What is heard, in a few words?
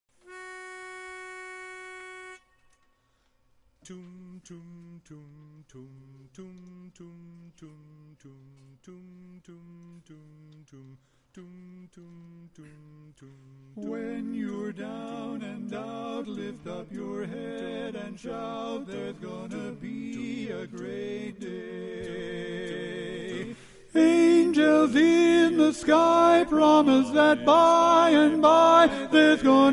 Tenor